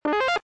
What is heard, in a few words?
pitfall jump; customize 2.0 soundset